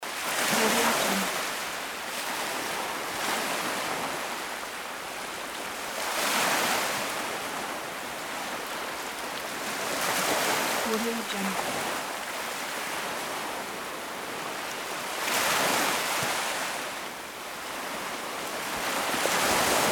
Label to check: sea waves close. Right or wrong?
Right